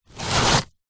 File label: household tissue pull remove from box